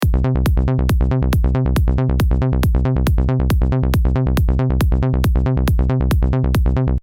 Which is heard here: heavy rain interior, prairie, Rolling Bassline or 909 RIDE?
Rolling Bassline